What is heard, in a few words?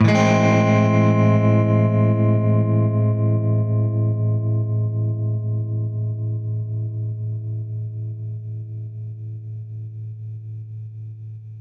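Baritone Chord A minor maj7, guitar chords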